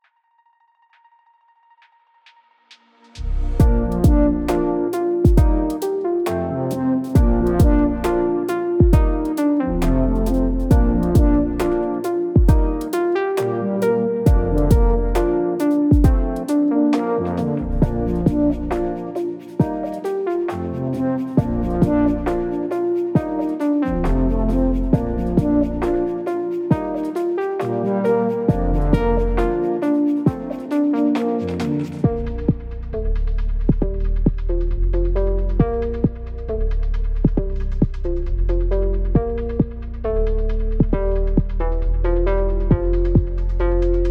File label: 135 bpm